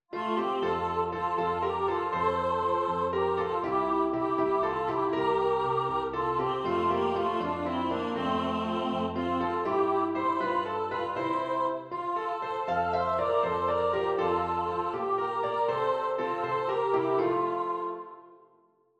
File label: music